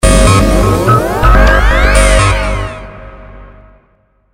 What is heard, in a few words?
music intro; CAC ASSETS